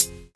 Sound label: 80s crunchy high hat B key; hat samples